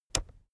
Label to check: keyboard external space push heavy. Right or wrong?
Right